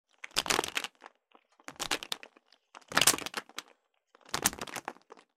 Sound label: Foley Elastic Band; Xayah